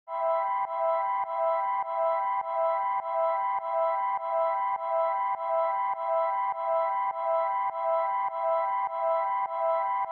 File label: ambient